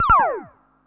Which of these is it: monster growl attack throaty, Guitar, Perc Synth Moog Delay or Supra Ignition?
Perc Synth Moog Delay